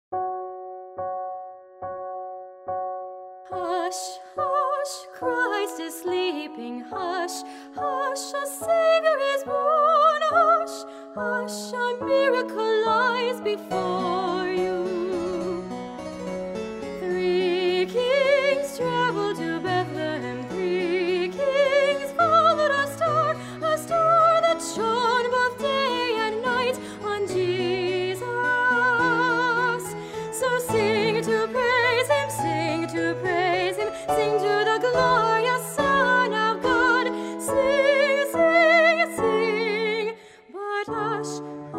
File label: solo